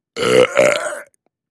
Med Strain Belch

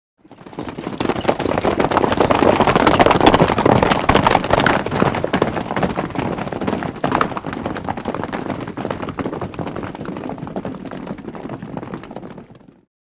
Horses Away Group Hor

